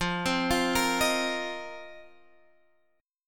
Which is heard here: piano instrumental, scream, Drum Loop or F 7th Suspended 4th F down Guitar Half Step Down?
F 7th Suspended 4th F down Guitar Half Step Down